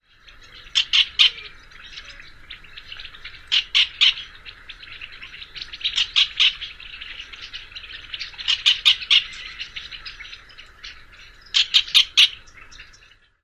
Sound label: calls; Yuma CA